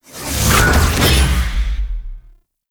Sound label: Simple Teleport; Enemies; Warframe; Pax Duviricus